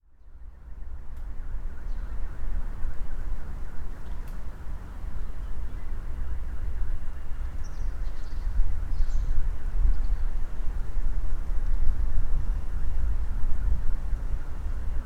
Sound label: deserted site; sergiyevposad